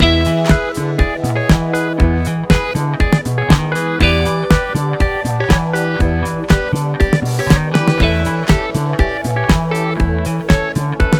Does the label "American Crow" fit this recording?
No